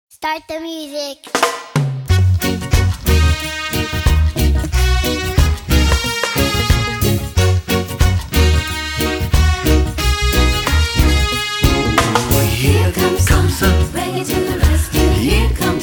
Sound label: Reggae Rescue